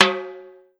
Perc Timbal

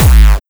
Kick Dist Short; Asdftekno

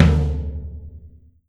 Tom Low

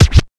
Sound FX Low Pitch Scratch

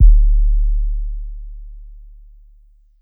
Kick 808

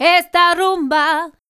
Vocal Esta Rumba; pitchglitch

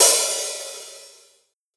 HiHat HalfOpen; K27 Trash

